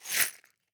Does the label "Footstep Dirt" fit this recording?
No